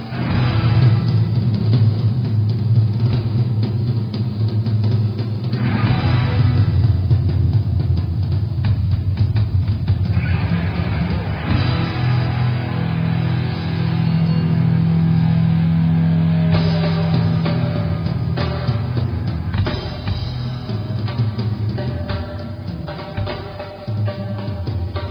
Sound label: Drum Solo; LA HOB February 28 1998